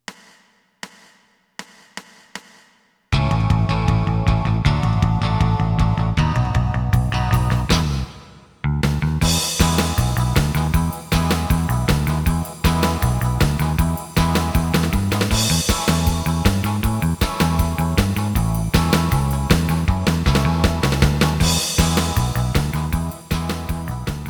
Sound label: lead